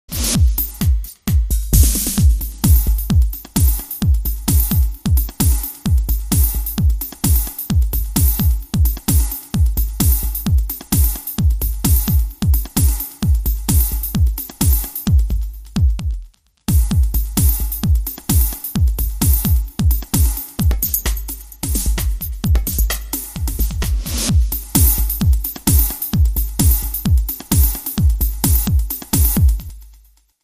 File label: Drum Mission